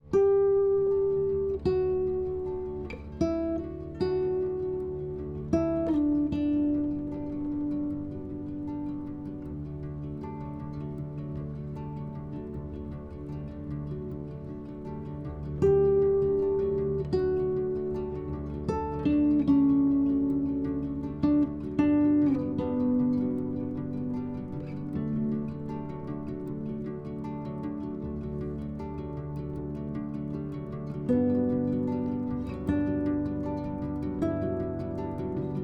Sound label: music railroad